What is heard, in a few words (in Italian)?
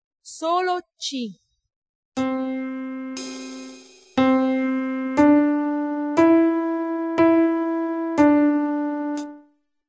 ritmo 3/4